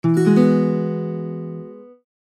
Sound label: Guitar; TCL 10 SE